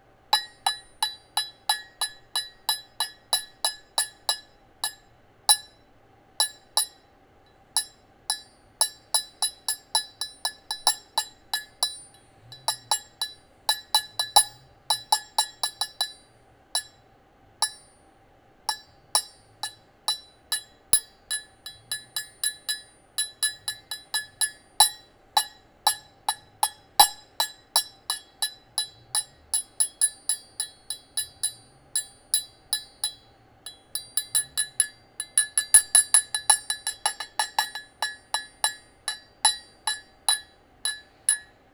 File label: clanging glass